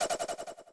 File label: chainsaw spin end, weapons